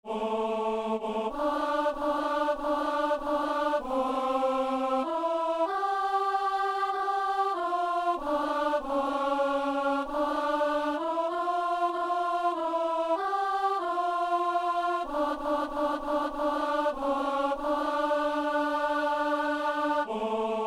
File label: alto; Messe de Saint-Jean - Kyrie